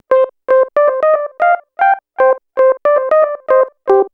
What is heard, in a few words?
lead (plucky); Sonic Foundry (Sony Creative Software); Ugly Remnants VOL-2; Leads